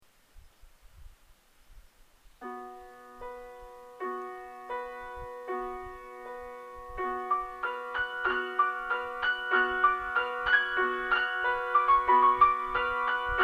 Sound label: rumanian folk, piano